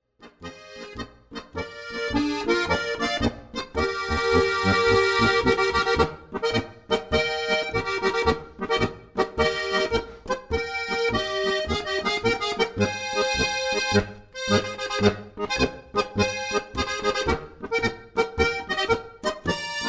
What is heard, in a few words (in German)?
springerl marsch